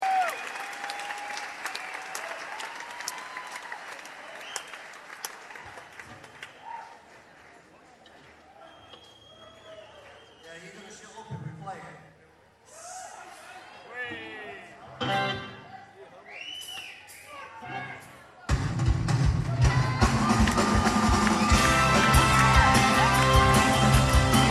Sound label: Ring The Bells